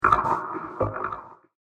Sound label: Swamp Spirit, ghost sound; Ghost Sound Effects; fantasy sound effect